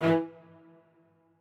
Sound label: strings